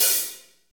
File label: HAT, Roland Fast Rock Hi-Hats